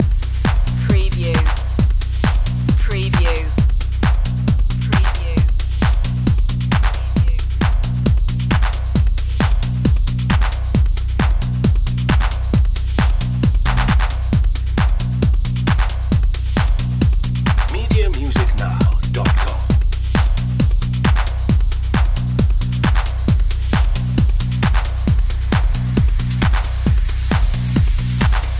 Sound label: nightfly loop